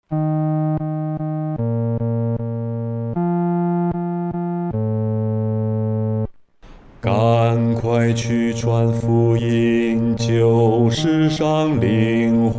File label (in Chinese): 独唱 第四声